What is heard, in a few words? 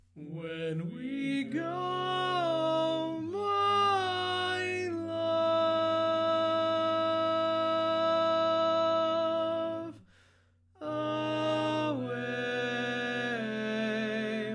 Tenor, barbershop